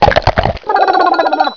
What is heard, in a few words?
chomp